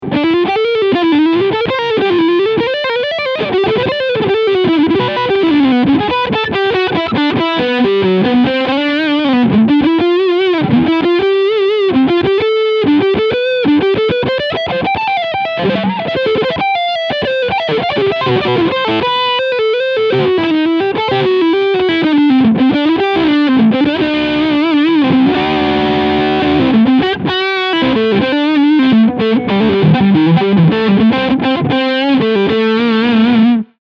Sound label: Charvel DK24 7 Lead Sound Front Humbucker